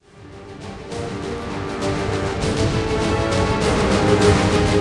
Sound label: Battle High Intro